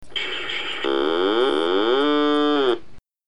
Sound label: startup